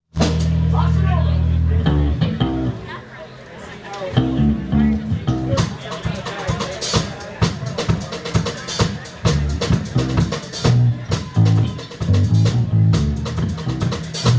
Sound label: bossanova; dentist